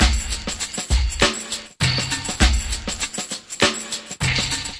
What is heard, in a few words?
100 bpm snare your way, drum loops and breaks; 091 to 100 bpm